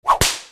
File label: Whip Crack